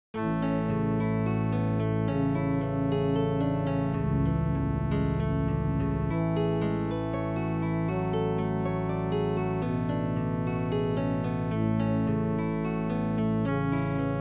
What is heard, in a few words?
amble